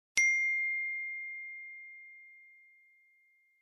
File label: iphone 13 sms